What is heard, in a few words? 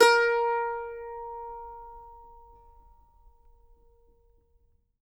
MANDO NOTES, A#4; Sampleheads; Whole Lotta Country 1 & 2